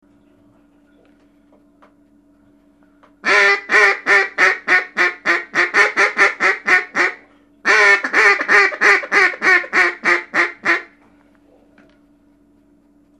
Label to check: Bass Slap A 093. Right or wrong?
Wrong